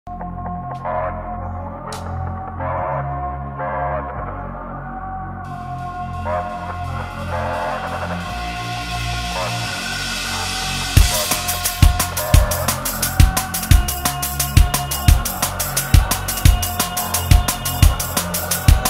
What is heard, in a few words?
extreme drum and bass cover